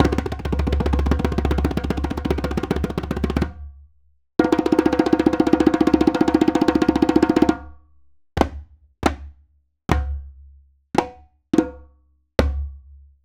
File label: Raw Perc Djembe